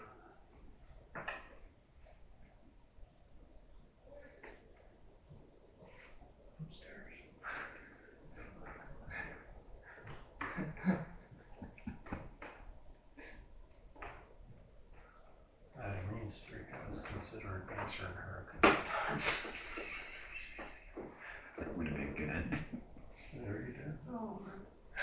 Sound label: laughter; Springfield Carriage Co